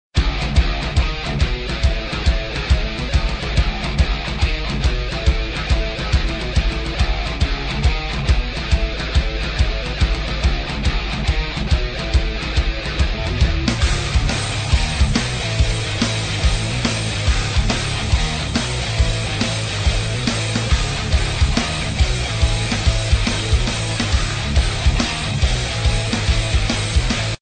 game music; Moto Beach Ride

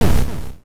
enemy shoot; Metal Bot

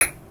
shoot; Space Shoot

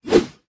santa throw; Santa Run

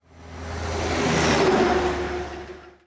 truck passing; DeadlyRace

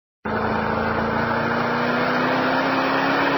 motor drive; Grave Drive

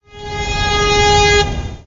horn single; DeadlyRace

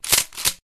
shotgun reload; DuckShooter

